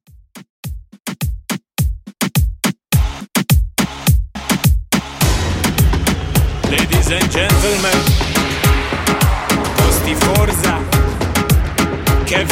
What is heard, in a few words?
Latin Reggaeton 105Bpm